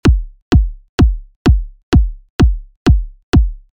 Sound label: kick psy